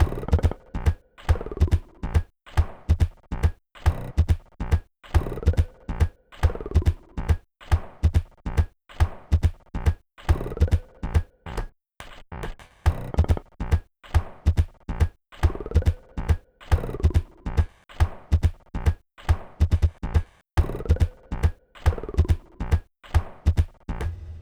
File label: 140 bpm Drums; remix fragments